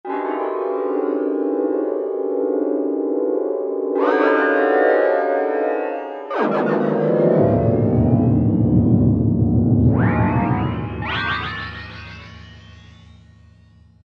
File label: Mech Wv